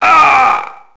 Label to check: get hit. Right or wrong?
Right